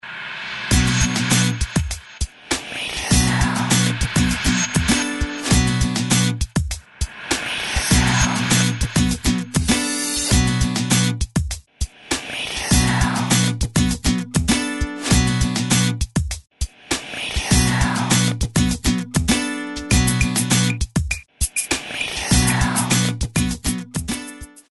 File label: House Dance 100BPM